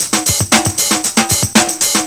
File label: LOOP; SoundFactory; DRUMLOOP